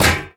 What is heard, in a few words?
physics turret gibs; Portal 2